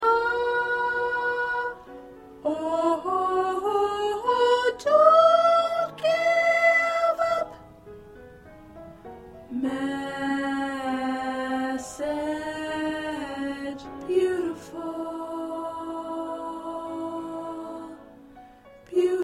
Soprano 1

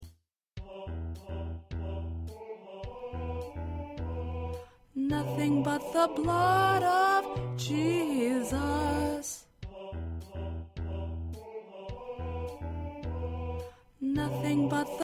1st Tenor

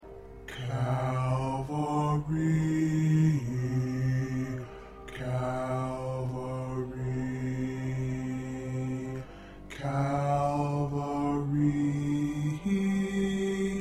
Bass

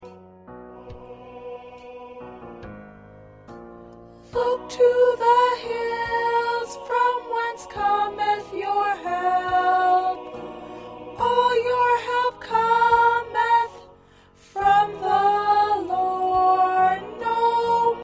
Soprano, choir

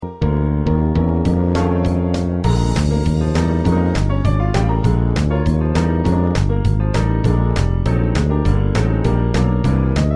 Accompaniment

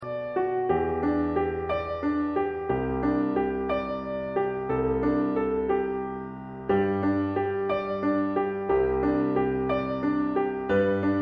Piano

